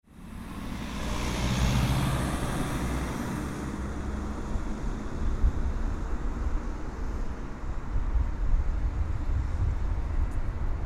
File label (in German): Stadtverkehr und Fußgängerzone ohne Windschutz